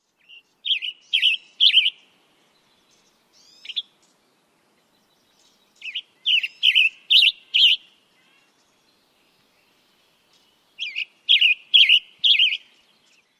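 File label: short songs and call, Western Tanager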